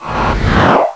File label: morph; Odyssey Demo